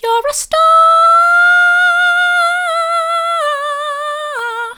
vocal

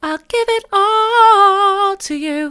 i'll give it all to you soft, vocal

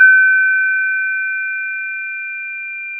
pop, Sound of a church bell

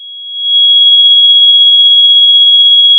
Sound of a tea kettle whistling

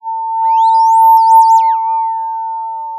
Sound of a police car siren

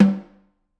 Snr Off, drum

